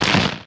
asteroid damage small; Factorio

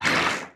wriggler attack, enemies; Factorio

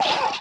wriggler death; Factorio

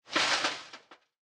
recycler trash; Factorio

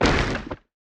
asteroid collision carbonic small; Factorio

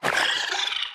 wriggler warcry, enemies; Factorio

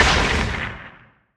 asteroid damage oxide medium; Factorio

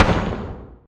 asteroid damage carbonic medium; Factorio

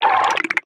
wriggler idle, enemies; Factorio

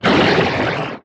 strafer warcry big, enemies; Factorio

